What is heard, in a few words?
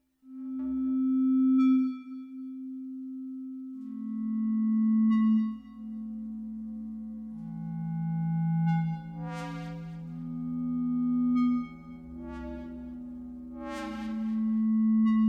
ambient, yamaha sy55